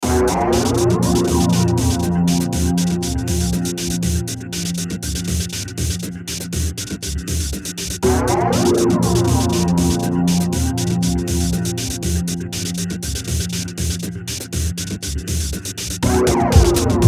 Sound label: BEAT EERIE TEC, Beat Eerie Techno Groove; Miscellaneous Loops